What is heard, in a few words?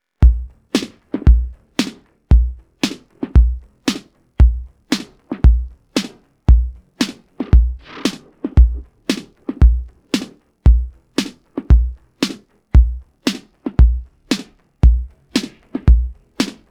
pattern, drums